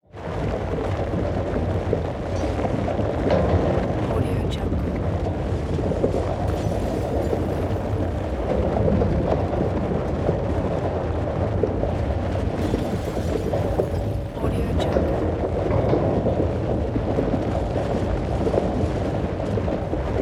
earthquake

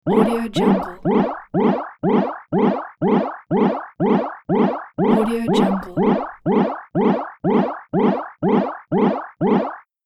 game eat or replenish health

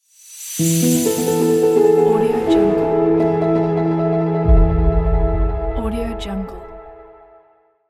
simple 3d logo

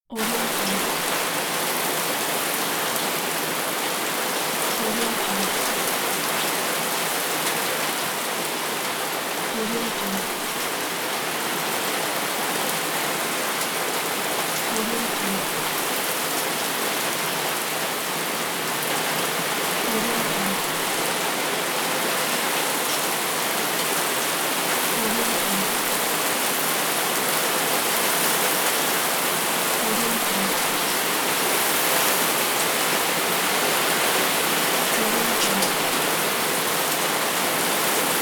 rain

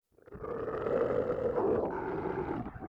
creature growls deep burbly breath grumble

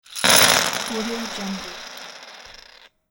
plastic game chips falling

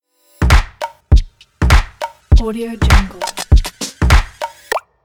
funny drums intro logo

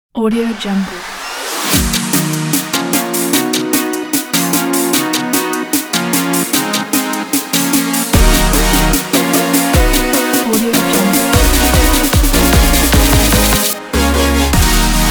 inspiring future bass

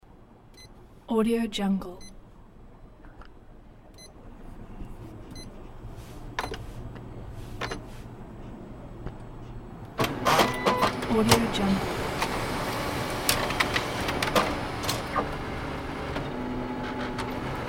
office copy machine makes a copy

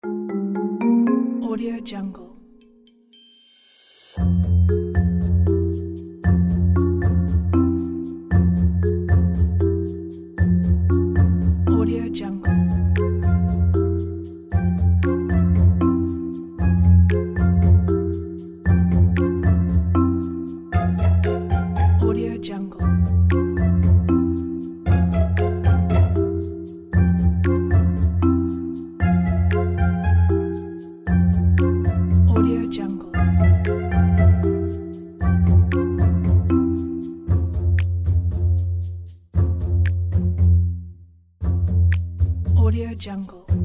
spy comedy; maryjanemusicstudio

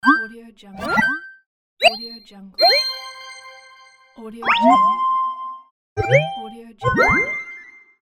ui menu popup message

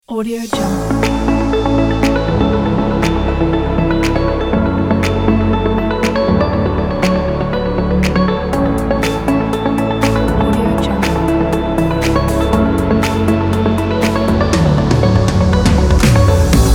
fun summer

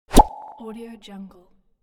pop

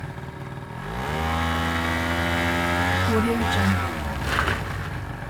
scooter accelerate brake; soundbits-soundfx

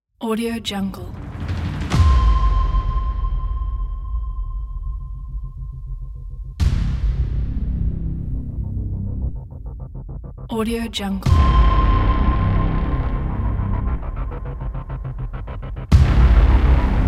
epic action trailer; outlaw audio

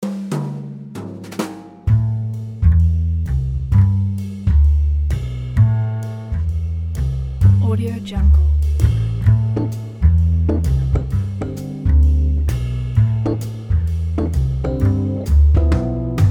jazzy secret speakeasy

